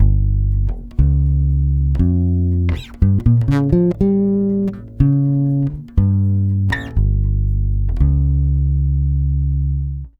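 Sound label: 120 bass G diminished, brazil bossa; USB Soundscan; AKAI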